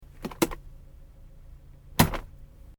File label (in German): Auto Handschuhfach